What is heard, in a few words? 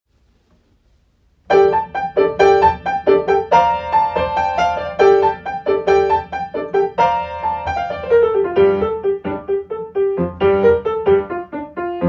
Piano track